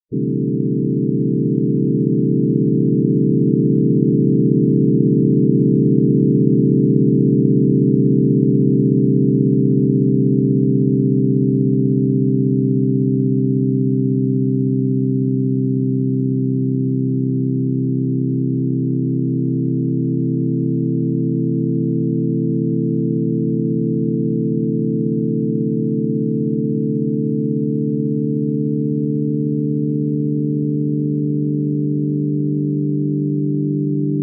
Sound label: pentatonic 3 limit; grainger